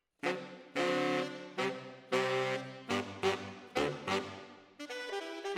ORTF SCHOEPS, piano

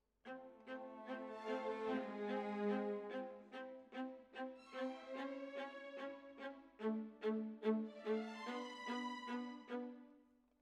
Violas, jazz for piano

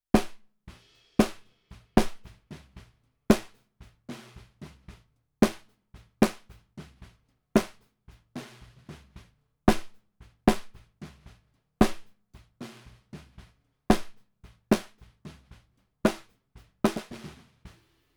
SNR Bot

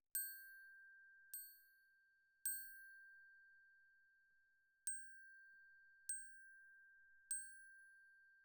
Glock Close

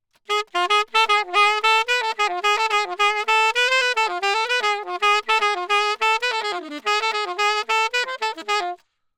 Sax; Gefell

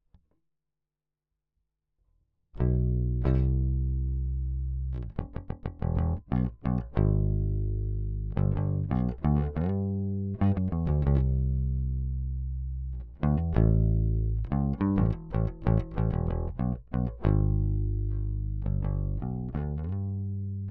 Bass

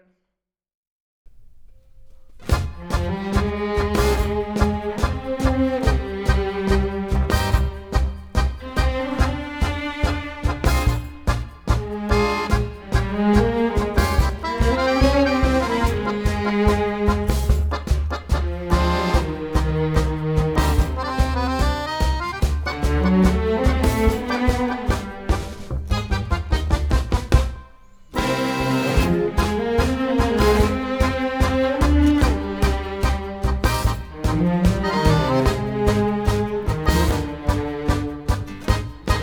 Tango no violin and piano

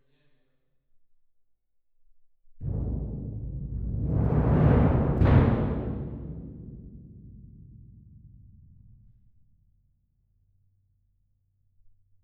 Twin R Perc

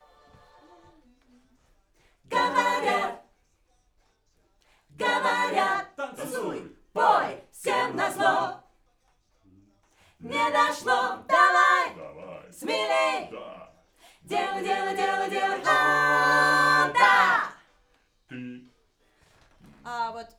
Backs AKG

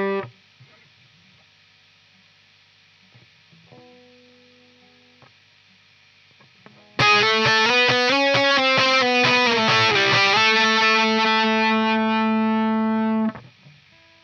Gtr

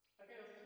Spring

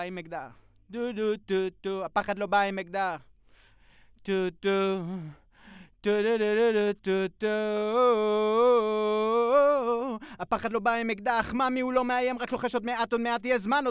VOX

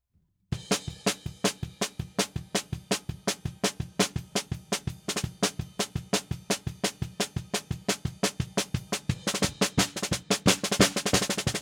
SNR BTM

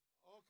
SNR

